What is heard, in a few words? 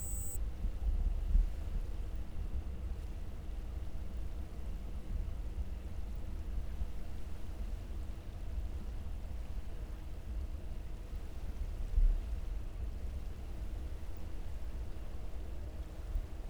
Hydrophone